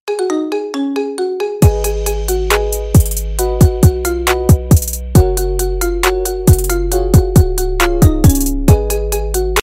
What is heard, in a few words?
iphone marimba cover ringtone